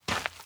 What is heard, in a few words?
jyari step; anemoi